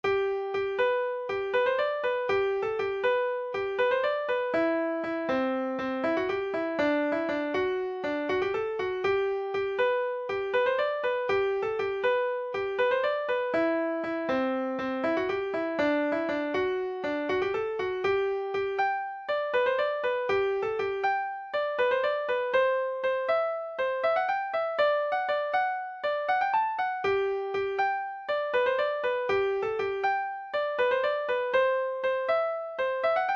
clarinet

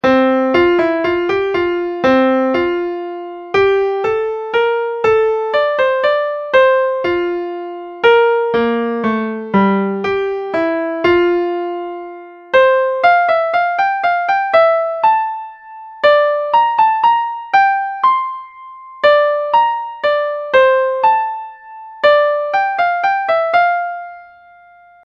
bass; minet

